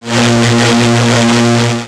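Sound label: Laser Fire Interior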